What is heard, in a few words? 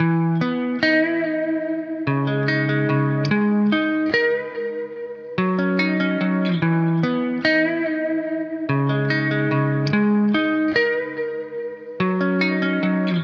145 BPM G# Min Main Guitar; Cymatics; Miami Guitar Pack - Pt 2; Stuck